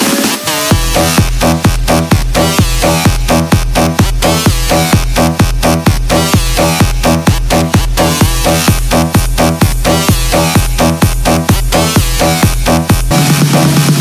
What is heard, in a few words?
Mobile Ringtone